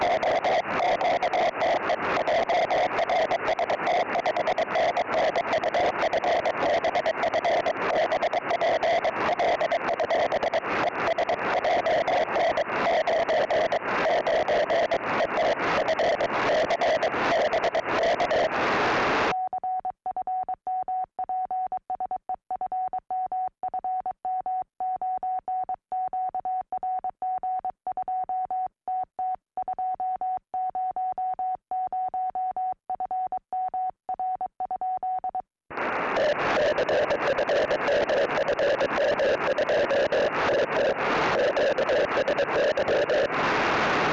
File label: 3cm cw; om3cls